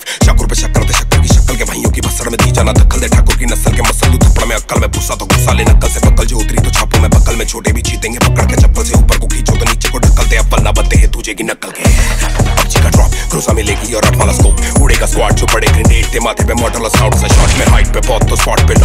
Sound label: Rap Ringtone